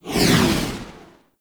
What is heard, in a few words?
Motorcycle Passby Whoosh Medium; Warframe; Motorcycle Ambience